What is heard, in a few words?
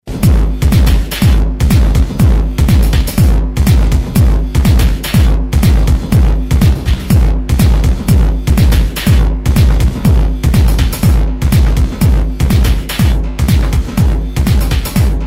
rhythm and noise digital hardcore